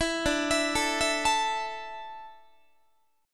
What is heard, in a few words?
D Suspended 2nd D, down, Guitar; 19,22,19,19,20,20; arpeggiated; 4A,4E,5A,5D,5G#,6C#